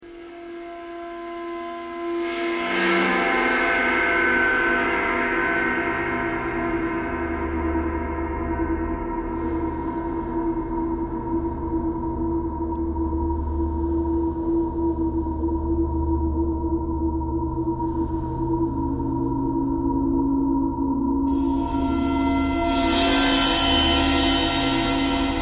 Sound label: traffic kcore birds a ice bowed cymbal thread simple; bowedmetal